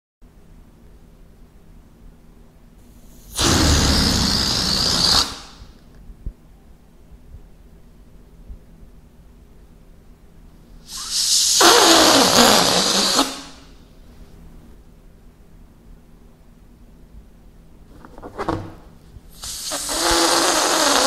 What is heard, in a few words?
Deflate